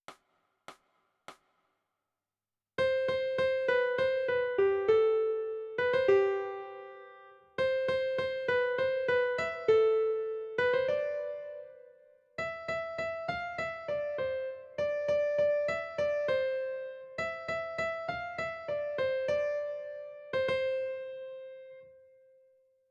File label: Melody